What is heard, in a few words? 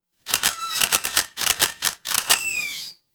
office camera